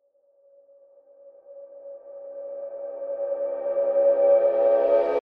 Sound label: Horror Crescendo Ghostly Cymbal Reverse; Blastwave